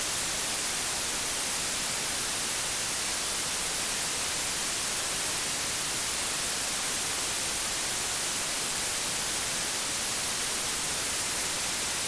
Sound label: WHITE NOISE